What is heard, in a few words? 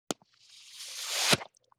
Wet Squoosh Pop; Vintage Cartoon; Hits & Tones